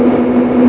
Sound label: metro move